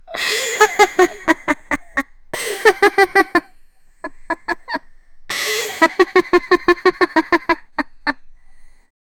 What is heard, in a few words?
puppy laughing